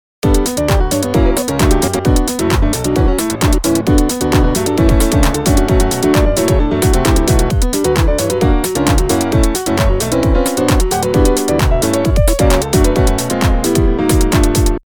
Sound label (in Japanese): エモいリリースカットピアノ